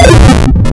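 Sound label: AM Complex Non Sine, wavetables, Amplitude Modulation; Architecture 32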